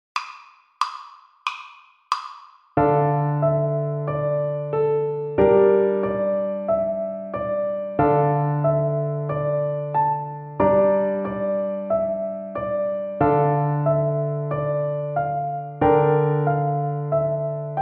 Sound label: String Gym, Piano Accompaniments